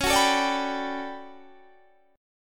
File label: D Major 13th C sharp, chord